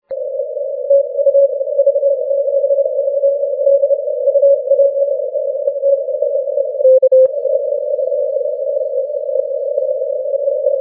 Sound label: 15m cw